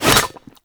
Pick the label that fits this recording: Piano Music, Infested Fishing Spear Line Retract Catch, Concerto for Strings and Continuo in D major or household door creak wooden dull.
Infested Fishing Spear Line Retract Catch